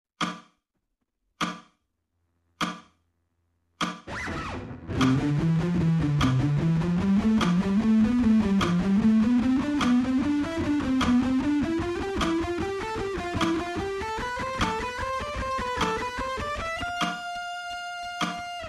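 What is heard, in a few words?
alternate picking slow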